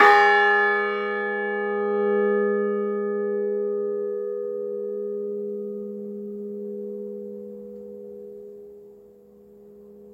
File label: bells of sussex; milland